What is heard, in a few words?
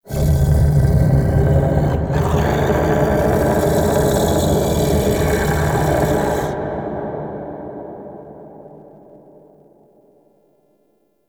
Misc Shouts; Ambience; Warframe; Man In The Wall; Entrati